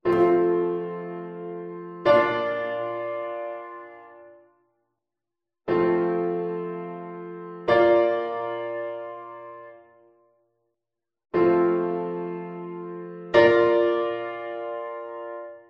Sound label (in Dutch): vierstemmige zetting; stemkruising, 'overlapping'